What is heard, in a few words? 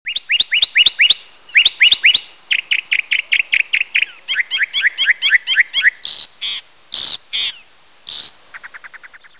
mockbird; svencoop